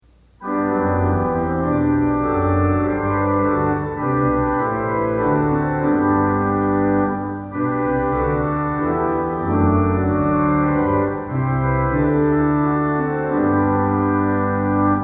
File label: Organ Music for Worship Services; Arise, Jerusalem, Receive Thy Lord; Twenty Liturgy Hymns